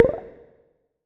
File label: slider, ChromeVox Earcons, Reverb